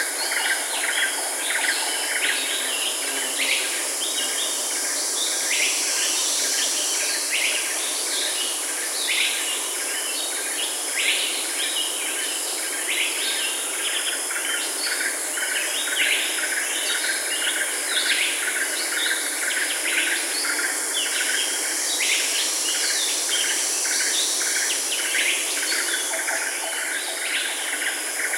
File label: jungle exterior; aztec2